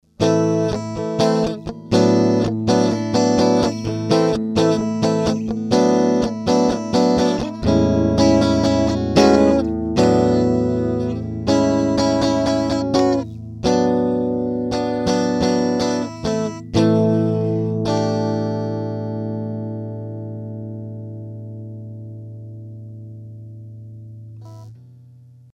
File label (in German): Gitarre; iRig Pro Quattro IO